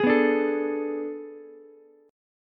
A Major 7th Suspended 2nd Suspended 4th, Ukulele; AM7sus2sus4; strummed; JGuitar

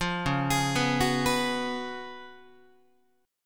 C sharp 11th Guitar, C#11 chord; arpeggiated; JGuitar

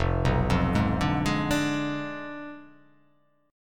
F Diminished 7th F down

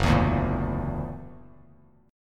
F sharp Augmented 7th, Bass Standard; strummed; 2,7,0,3; JGuitar

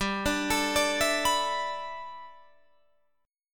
G 6th Add 9th G Guitar Drop D, chord

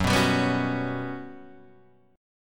G Major Sharp 11th Gb, chord

